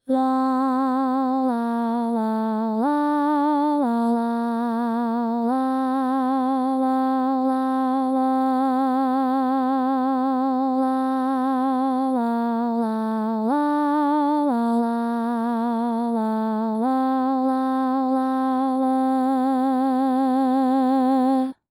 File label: Alto